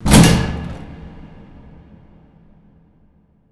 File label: impact hatch; Hits